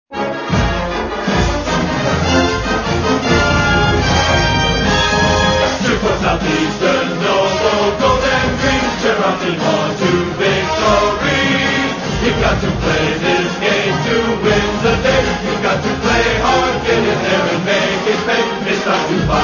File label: Cheer Our Team vocal; Southeastern Louisiana